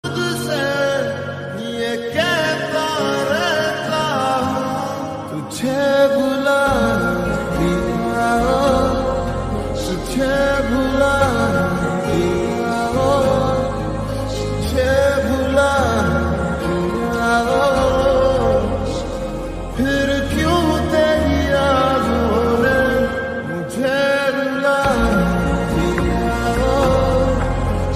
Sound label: Emotional Sad Ringtone